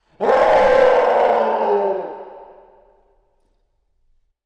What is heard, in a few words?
Demon Scream